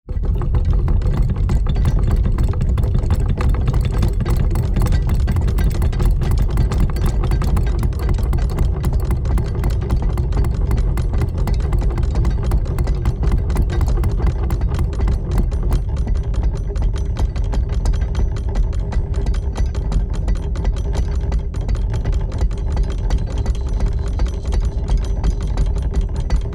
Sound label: Rotating vintage mechanism sequence; steampunk; gfxsounds